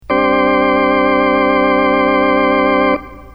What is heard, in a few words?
organ, scanner vibrato; jurgen Haible; synth